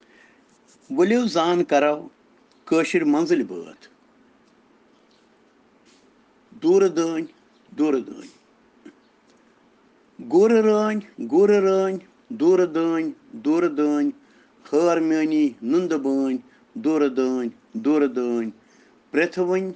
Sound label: Children Rhyms in Kashmiri